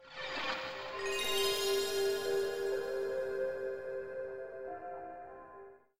Startup; PSOne

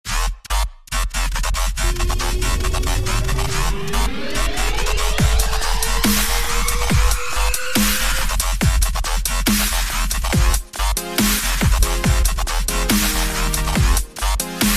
Dubstep Mix

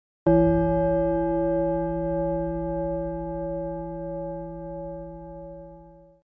Gong; Tagesschau

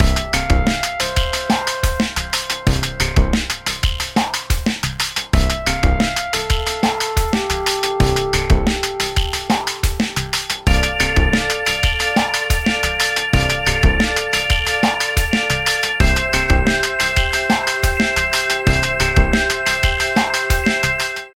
Chillout

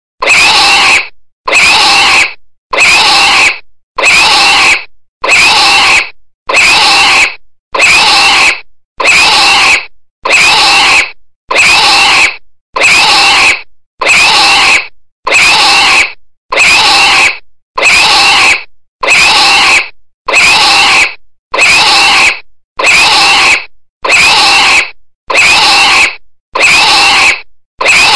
Screaming Monkey

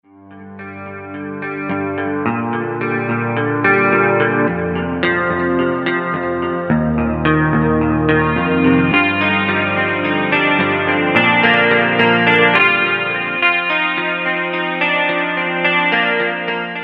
Melo Guitar

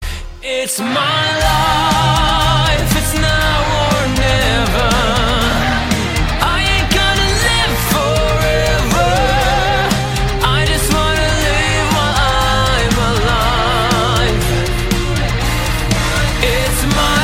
metal

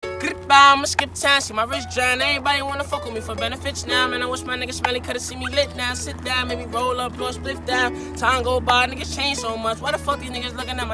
Acoustic

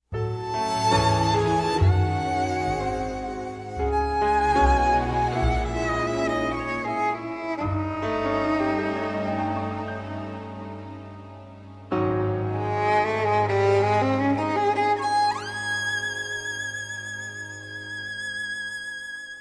Violin

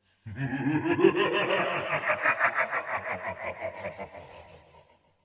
Demonic Laugh

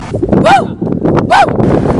Barking

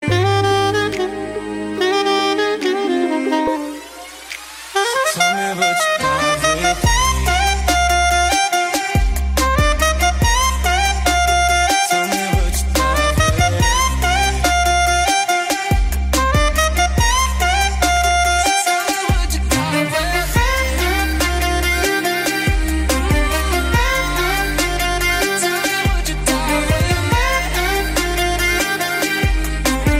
Violin

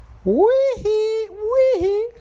Weee

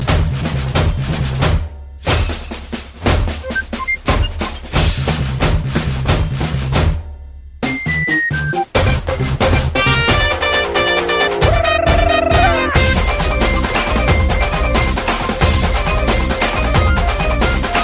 Tai Tai Beat

